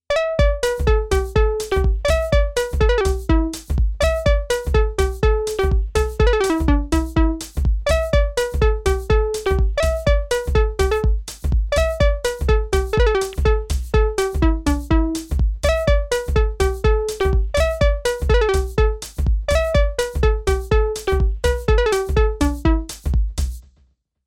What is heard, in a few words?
Behringer Kobol Steeldrum